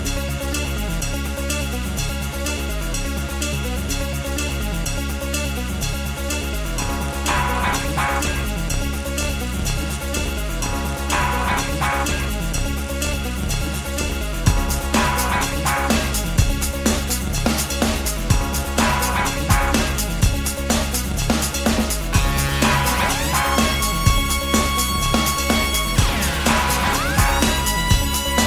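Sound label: song music ringtone, tamil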